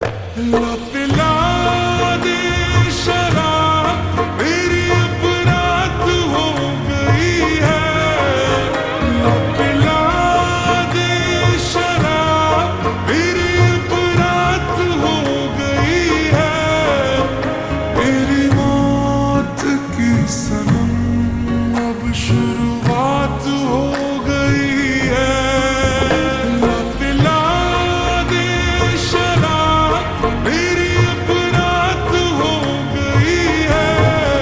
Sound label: Ringtone Slowed Reverb